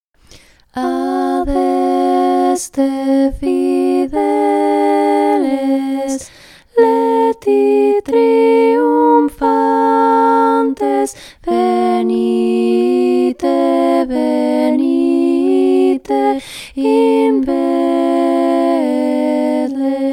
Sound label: 2 voices